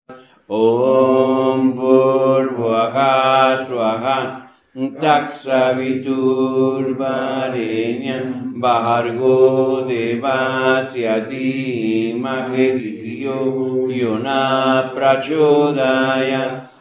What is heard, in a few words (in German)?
Gayatri Hauptstimme betont, Mantren